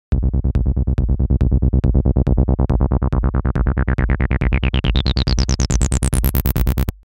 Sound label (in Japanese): dance music